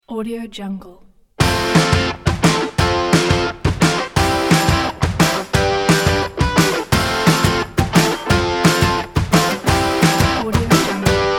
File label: energetic indie